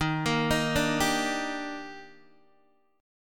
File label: D sharp Major 7th, Guitar DADGAD